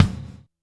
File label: kick, Hardcore Breakbeat, 134 Emin; Big Fish Audio; Hardcore Breakbeat Action; Mellow Jack